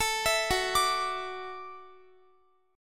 F sharp Minor 7th Sharp 5th F sharp, Guitar; F#m7#5 arpeggiated; x,x,19,21,18,21

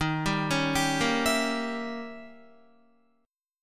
Eb 7th Flat 9th, Eb, Guitar; 10,10,13,12,10,12; Eb7b9 arpeggiated; JGuitar

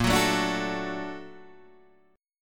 A sharp Augmented Major 7th A sharp Guitar DADGAD

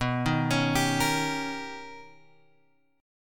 B Augmented Major 9th B Guitar Open F, chord; B+M9; arpeggiated; 11,10,13,14,13,x; JGuitar

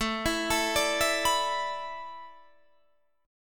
A Suspended 2nd Suspended 4th, Guitar, Standard; Asus2sus4 arpeggiated; JGuitar

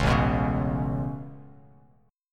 A Major Add 9th A Bass Standard, chord; Aadd9 strummed; JGuitar

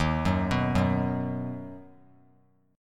Eb Minor Sharp 5th; Ebm#5 arpeggiated; JGuitar

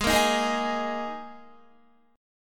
G sharp Suspended 2nd, Guitar; strummed

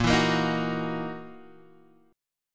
Gb 13th Bb, guitar

